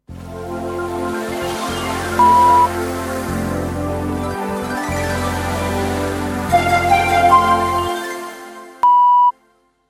Sound Logo mit Sound Bett 5 Toene Dream

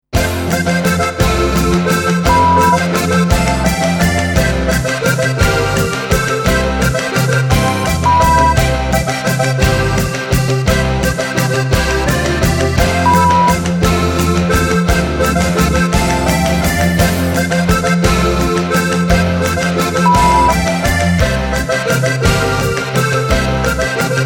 Alpenrock Landler; Volksmusik, alpenländische, bayerische Musik; Zillertal-Sound, Oberkrainer, Zither

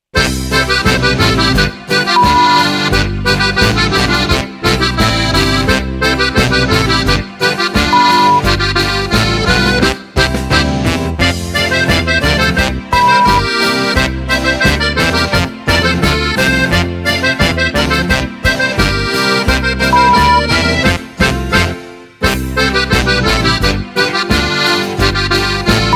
Zillertaler Boarischer B; Volksmusik, alpenländische, bayerische Musik, Zillertal-Sound; Oberkrainer, Zither

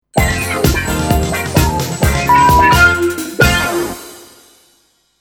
Sound Logo mit Sound Bett 2 Toene funkig; Soundlogos für Ihr Corporate Design

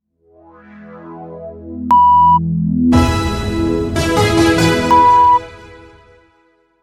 Sound Logo mit Sound Bett 4 Toene; Soundlogos für Ihr Corporate Design

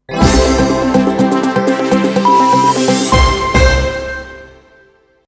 Sound Logo mit Sound Bett 2 Toene Sweep; Soundlogos für Ihr Corporate Design

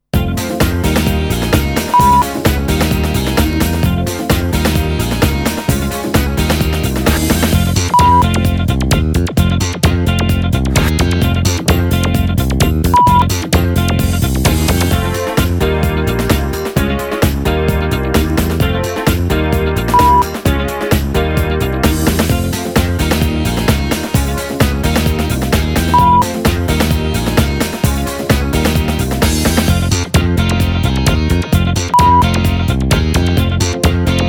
Sound Logo mit Soundbett long 2 Töne 130bpm

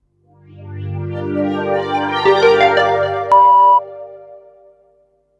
SoundLogo 4 Toene; Soundlogos für Ihr Corporate Design

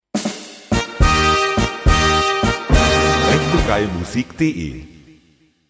Tusch tata tata tata

